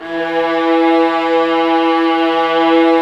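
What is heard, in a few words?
violas, ambience, forte; String Master Series; Vlas Sect3-4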